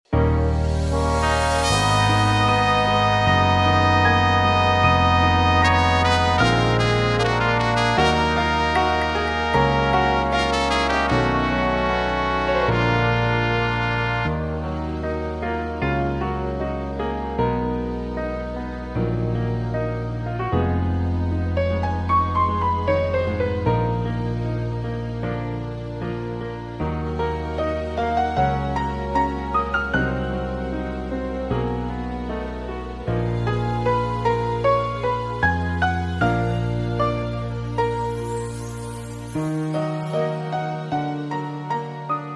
Eb No Backing Vocals

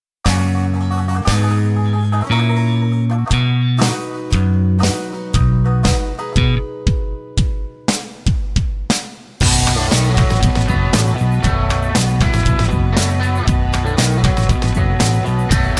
(B) No Backing Vocals

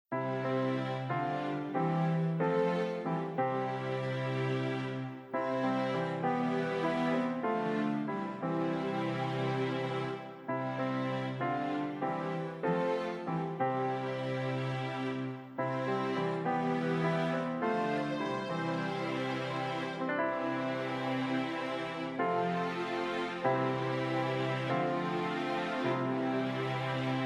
(D) No Backing Vocals

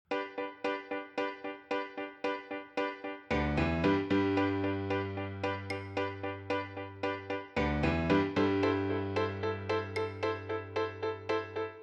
G No Backing Vocals

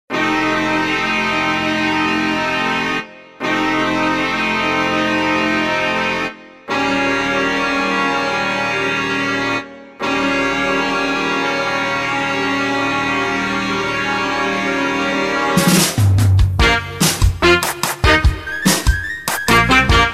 (Bb) No Backing Vocals

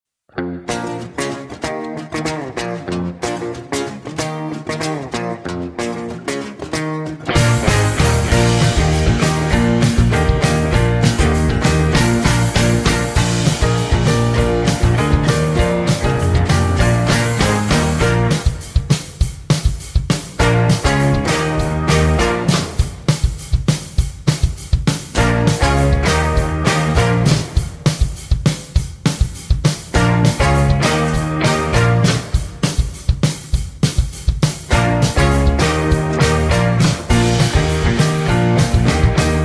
(Bb) no backing vocals

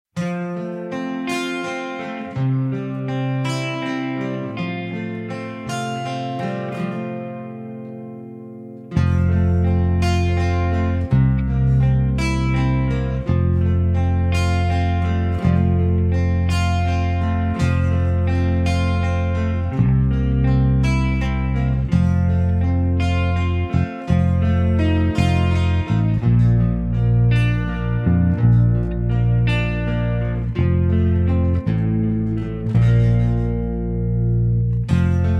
(F) No Backing Vocals

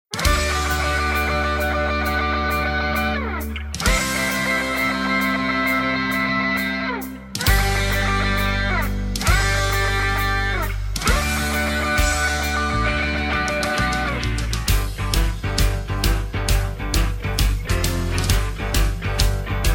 (G) No Backing Vocals

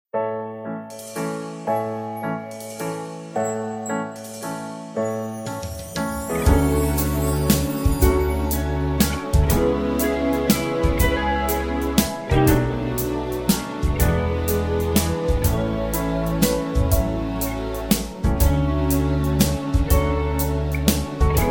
A No Backing Vocals

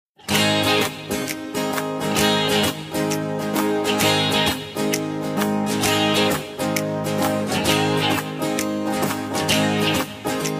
Ab No Backing Vocals

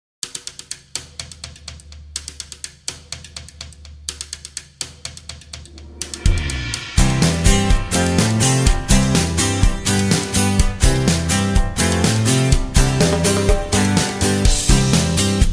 C No Backing Vocals

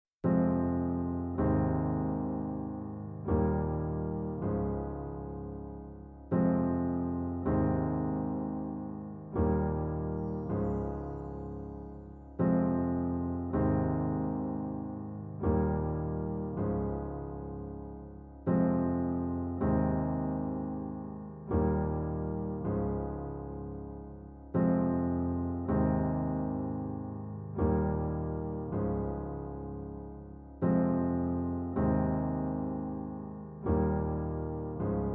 (Fm) No Backing Vocals

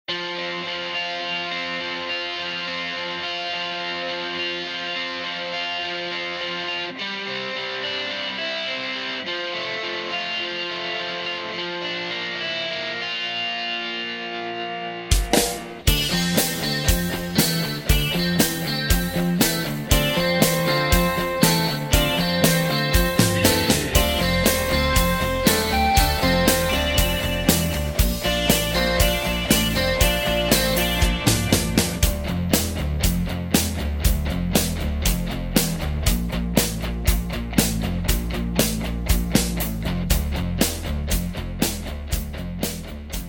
(F) No Backing Vocals

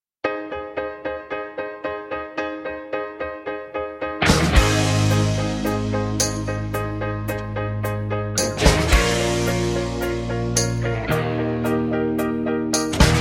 No Backing Vocals

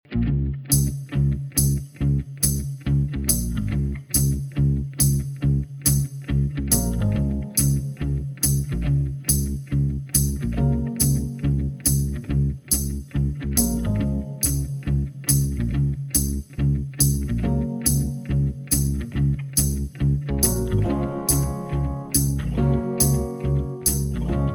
(Db) No Backing Vocals

